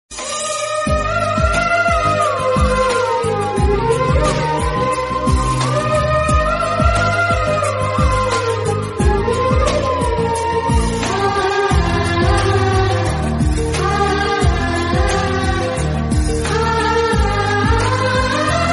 sad bgm theme ringtone heart touching bgm instrumental ringtone; adavi ramudu